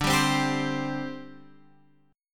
D 7th Suspended 4th, down, Guitar, Half Step Down

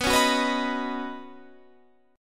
Eb Minor 7th Sharp 5th B

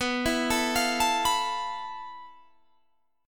F Major 7th Flat 5th B, guitar chord

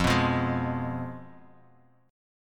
F Diminished, Guitar, Standard; strummed; 1,2,3,1,x,x; JGuitar

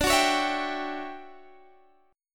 D Major 11th Guitar Standard, chord; strummed; JGuitar

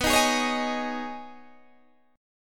B 6th Add 9th B Guitar Open D, chord

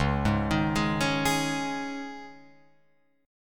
Db Suspended 2nd, Guitar, Open F, Dbsus2 chord; arpeggiated; JGuitar

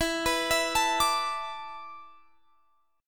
E 7th Suspended 4th; E7sus4; arpeggiated; x,19,21,21,22,22

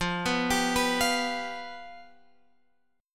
F Suspended 2nd Flat 5th, down, Guitar, Open G

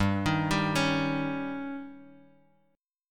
D sharp 7th G, Guitar

